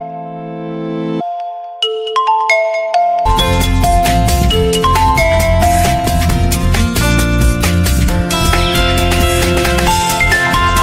oppo mobile ringtone

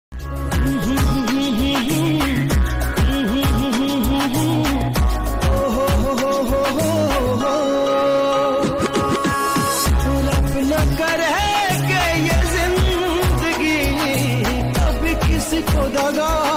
old hindi love song ringtone

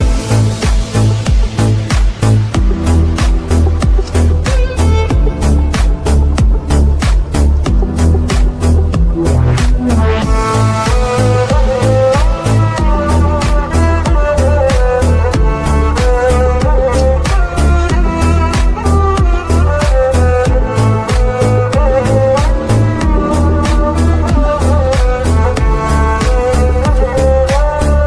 honor 9n ringtone

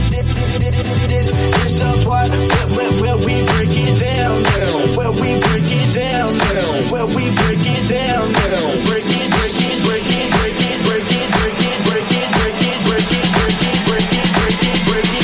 Huawei honor ringtone

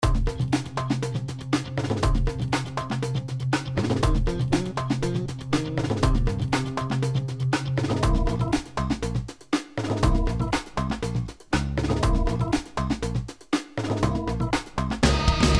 Huawei Ringtone; Huawei Mate 10 Lite

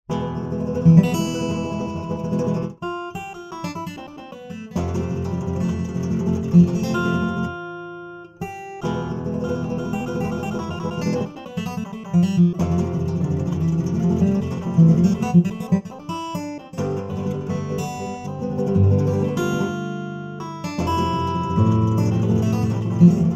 Guitar Sound Ringtone

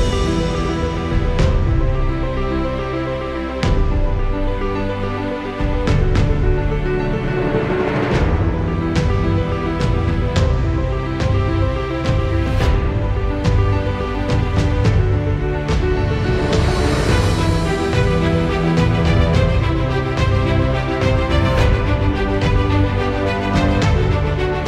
Vivo Mobile Ringtone

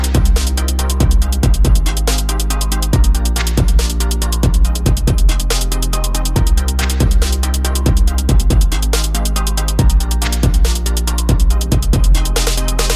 Huawei Tone

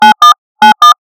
Sms Ringtone; Samsung A03 Core

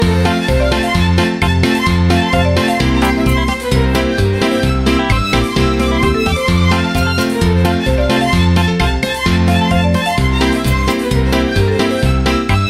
Lg Velvet 5G Ringtone

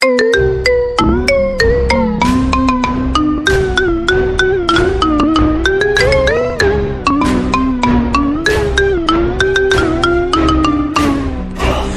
Sea Shanty Marimba Remix iPhone Ringtone; iPhone 13

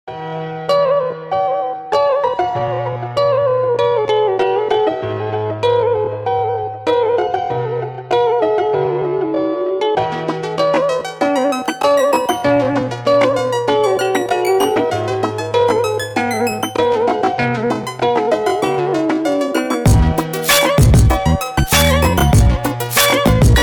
Blu Phone Ringtone

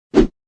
Whatsapp Text Tone

